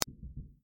Running

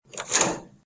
toilet door; One Night Hot Springs

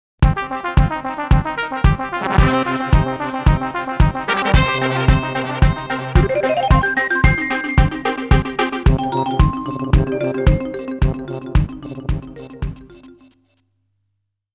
Fanfare; Super Donkey Kong 3

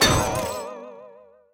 Metal Hit

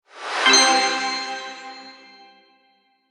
Achievement Sound; Zarya and the Cursed Skull

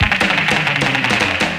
Music Race Intro 1 bar; Deepseadriving

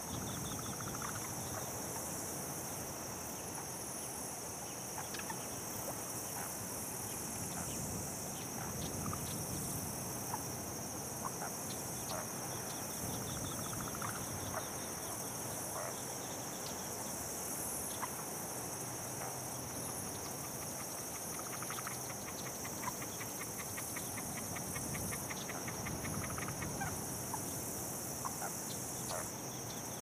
Desert Ambience; Power up Play Ground; Angry Birds Seasons

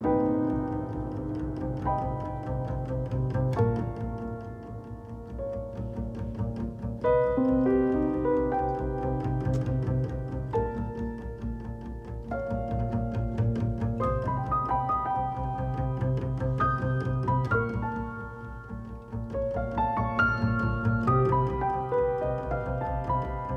Music Scary

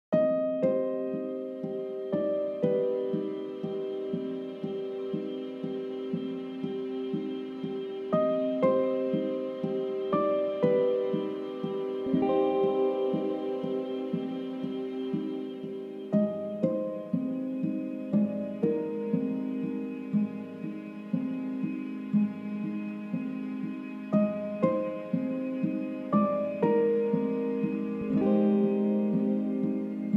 music

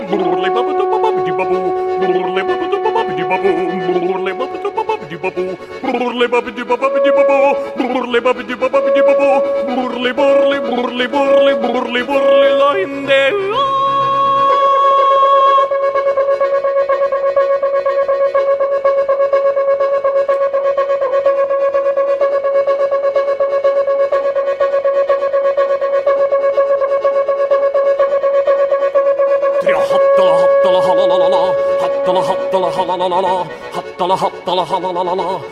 Dance; Shadow of the Game

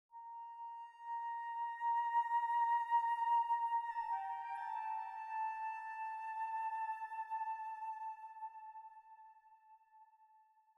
Flute 58bpm 4-4

